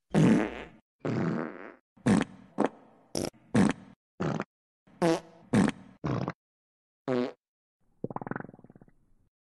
farting sound; som de peido

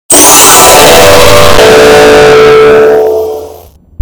correct noise; education perfect

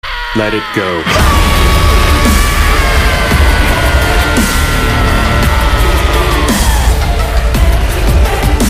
dubstep remix; gohan turns super saiyan 2 for the first time

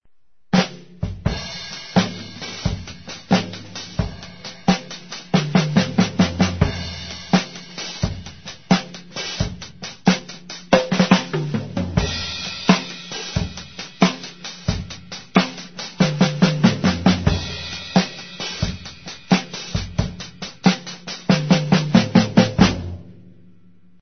drum, tambores

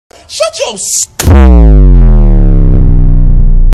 bonk

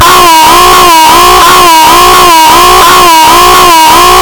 horror attraction jumpscare sound; piggys

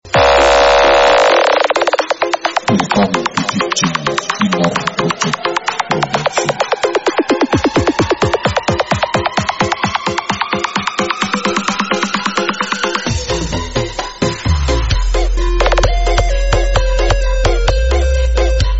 full bass

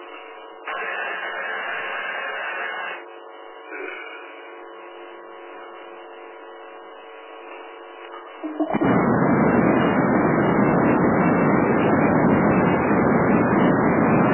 guy breathing on cb radio

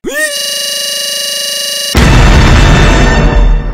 tnt box boom

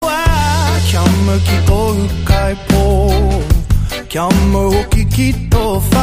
full band

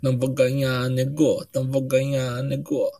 sings theme; jinder mahal

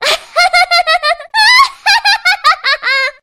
limit laugh; larxene

